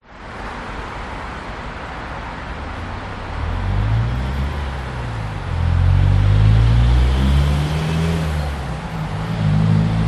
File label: vehicles traffic fast moving main road cars passing, Sydney, Australia